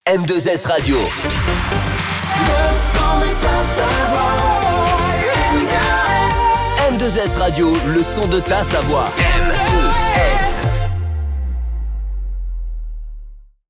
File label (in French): Jingle Chante; M2S